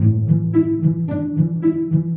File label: strings, Sad